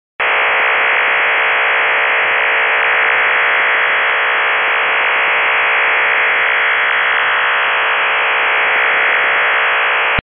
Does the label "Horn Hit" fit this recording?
No